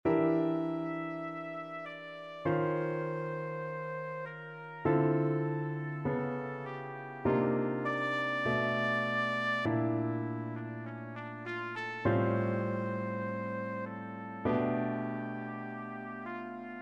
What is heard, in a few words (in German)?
Klavier stark